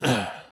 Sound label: Movement Land; Batman